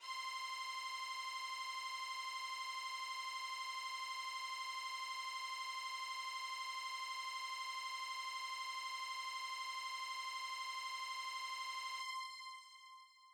open

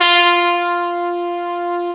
Tuba F4; Star Trek JR Audio

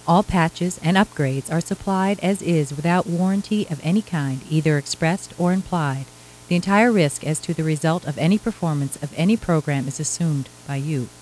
warning

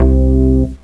bass; Descent II

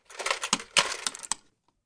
o planting exp; The Sum of All Fears

